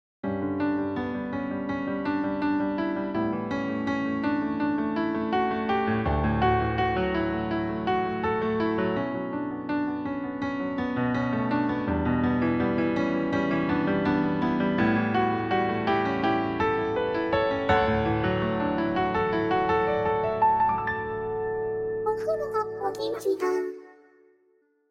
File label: chime